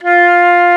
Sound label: FLT FL F4, Flutes; Roland LCDP04; 90 s Sample CDs; C Flutes 3-8; C Flt Sh 6&7